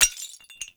Ferro Pillar Impact Hit; Gameplay; Warframe; Tau; Destructible Dynamic

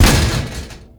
Metal Debris Impact; Gameplay; Warframe; Tau

